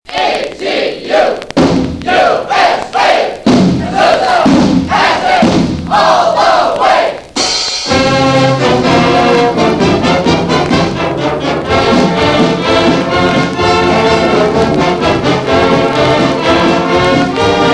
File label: Azusa High Fight Song